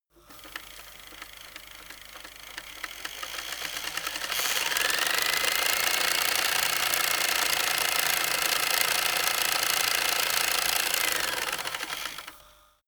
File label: servo rattle increase stop